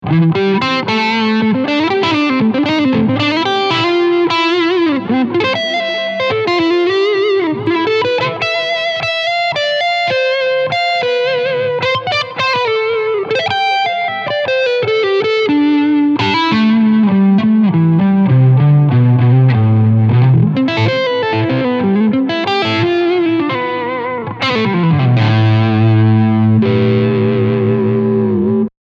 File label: HB Low Gain